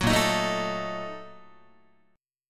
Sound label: A sharp Major 7th Suspended 4th Sharp 5th, Guitar